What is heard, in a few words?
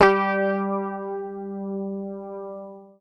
PICK, Bass Library